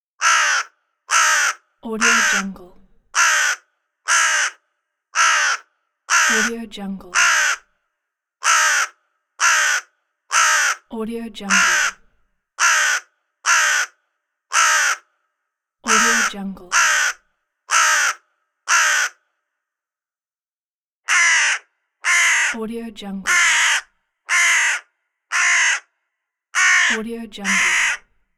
crow; sounddivision

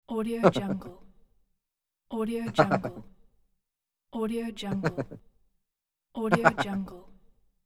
chuckle laugh

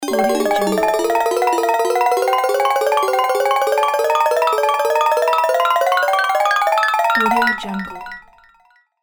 positive bells slot machine win

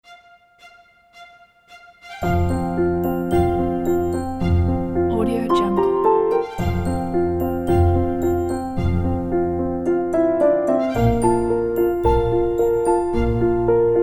spring birds piano

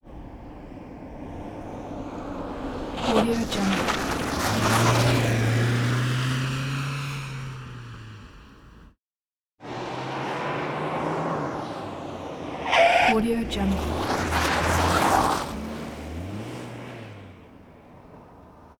volvo 740 sl on gravel in skid

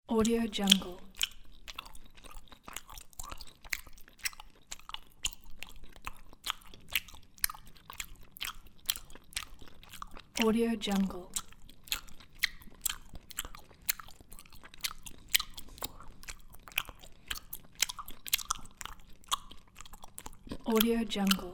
chewing gum

